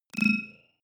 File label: data transfer tonal, signale; soundpool